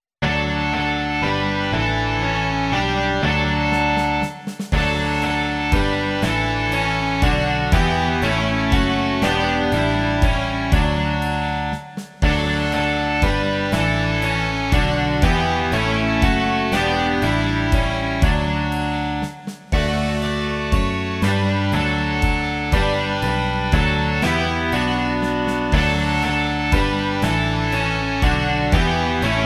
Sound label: rock